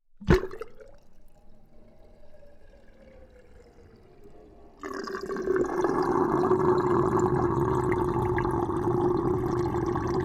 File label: Sink Drain Panning Left To Right, Household